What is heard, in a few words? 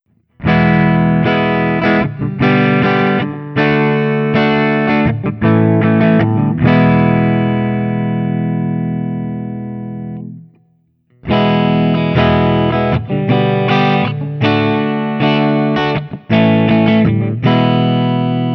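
Guild Burnside Crossbow ODS100 Open, Clean, Open Chords; Burnside (by Guild) Crossbow; Open Chords #2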